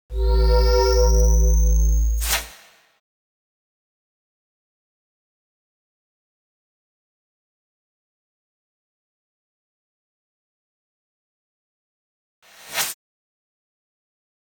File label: SFX